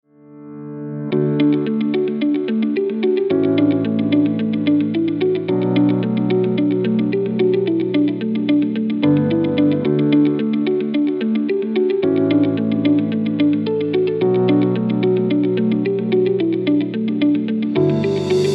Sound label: upbeat inspiring corporate